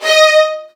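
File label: violin; Virtuoso Strings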